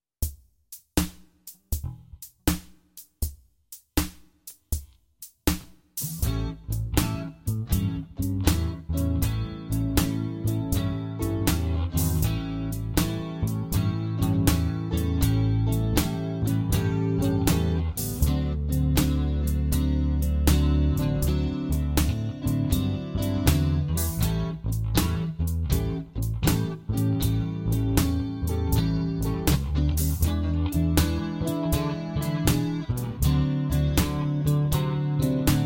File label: backingtrack 80bpm